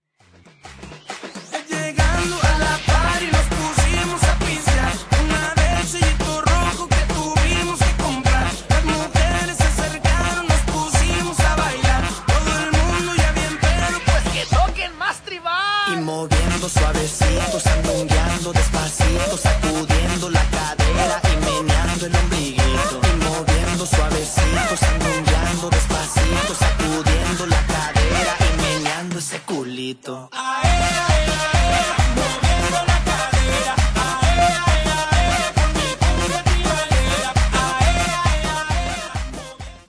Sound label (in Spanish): cumbia tribalera